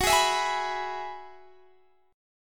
Gb 7th Suspended 2nd Suspended 4th, Guitar Standard; strummed; JGuitar